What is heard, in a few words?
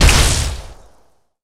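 Weapon alien cannon fire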